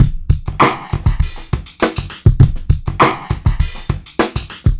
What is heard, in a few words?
Street Tip, R&B Drums